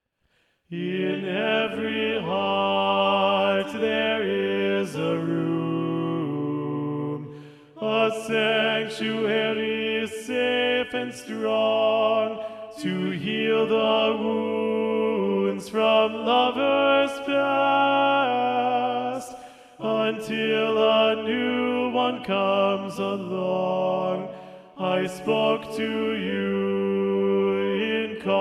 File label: Tenor; Practice Room